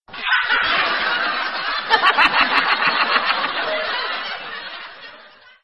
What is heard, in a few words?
hahaha